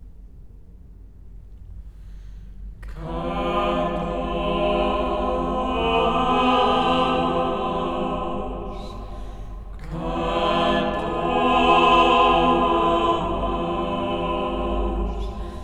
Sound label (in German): Chor; Quadosch